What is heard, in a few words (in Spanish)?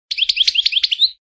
floreo tobi tobi; campero